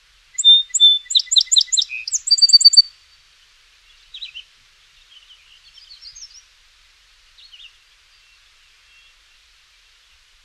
song sparrow

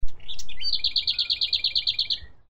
lesser whitethroat; totteridge

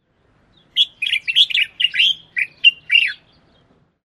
common bulbul

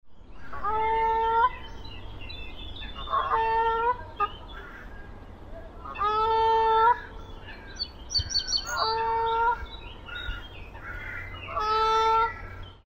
canada goose

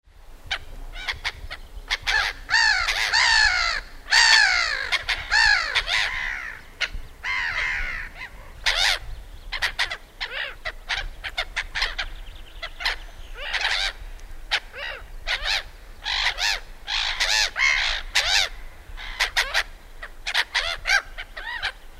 black headed gull

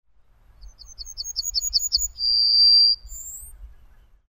yellowhammer; teremiski

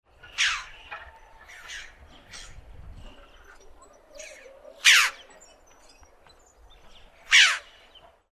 red billed chough

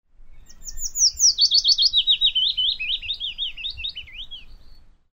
willow warbler

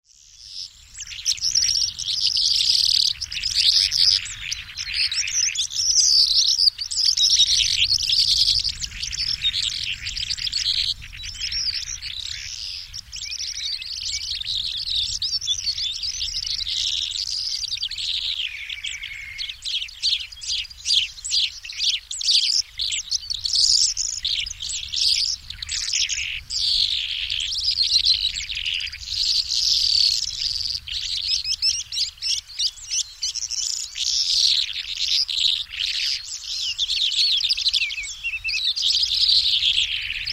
calandra lark

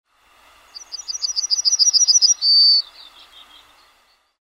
yellowhammer

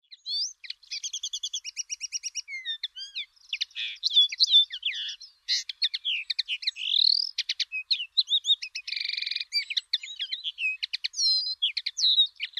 linnet

